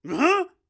floor fall; Zote; Hollow Knight

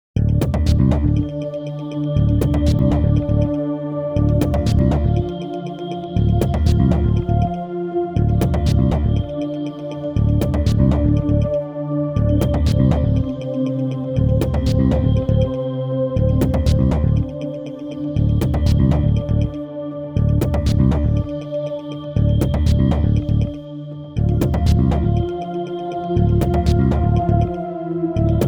Tension; Shin Megami Tensei V Original Soundtrack

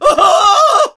Scared Male; friday the 13th killer puzzle

